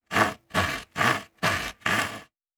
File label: Saw Wood; Fantasy Sounds Bundle; Craft